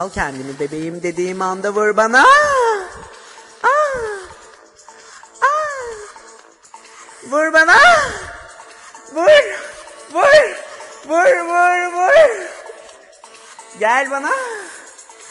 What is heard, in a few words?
AahHhH